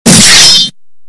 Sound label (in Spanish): espada loud